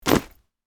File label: School bag backpack drop on the floor; luggage